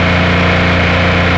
vehicle

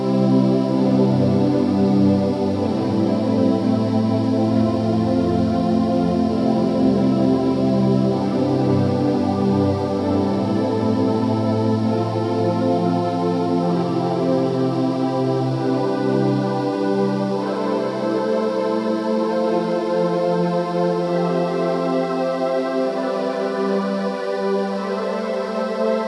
theme; sadpuppy

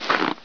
snow

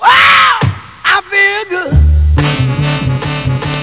feel good

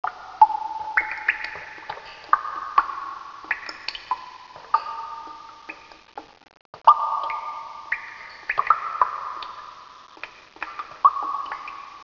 cave drips

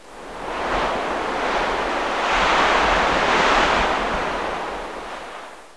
sand; de commence

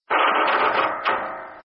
Metal Stress; docks

